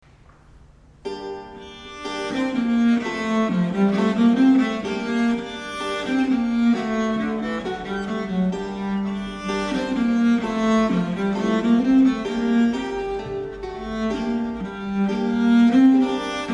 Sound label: early music renaissance spanish moorish elizabethan recercarda primera